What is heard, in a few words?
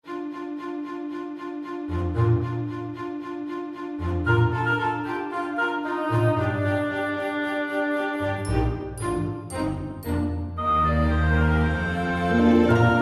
Ambient - Peaceful